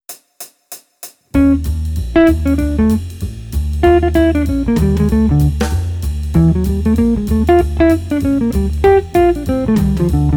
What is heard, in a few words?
Jazz, Guitar; Guitar Techniques 2018 Issue 289 December